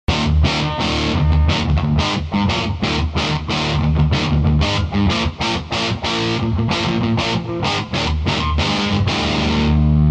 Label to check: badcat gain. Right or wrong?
Right